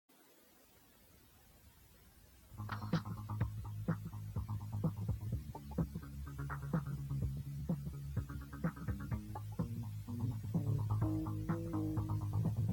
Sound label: Piano Rythm Good but Muffled